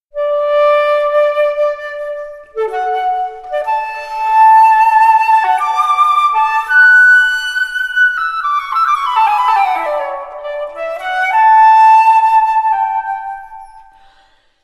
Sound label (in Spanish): flauta completo